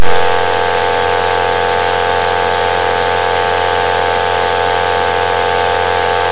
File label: Dial Tone 10 Feet